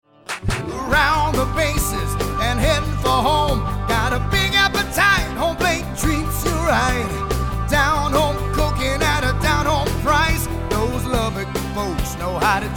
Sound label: Jingle; HomePlate